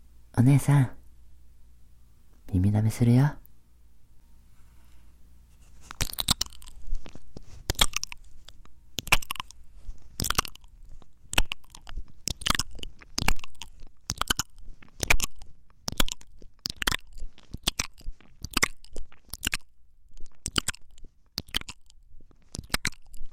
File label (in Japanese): 耳舐め, ASMR; マグロ漁船 漁師達の餌食